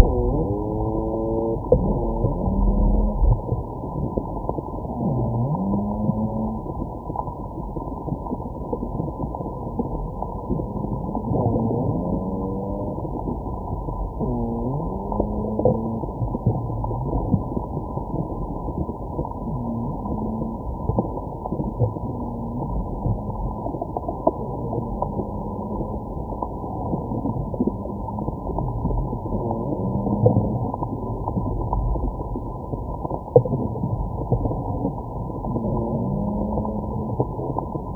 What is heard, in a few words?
fish chorus loud